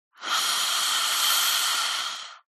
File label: HUMAN BREATH Female Eerie Hiss; smartsound